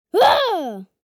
Kid yelling playfully

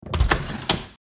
Plastic PVC door open; household

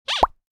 Designed pop up

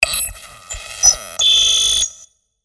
Glitch accent distorted computer

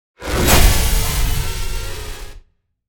Horror accent jumpscare suspense short

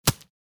Bullet hit body impact

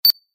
Simple beep tone

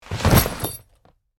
Tactical gear bag drop impact

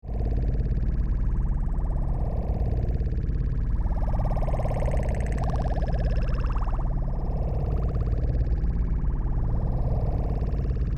Spaceship ambience loopable interior alien

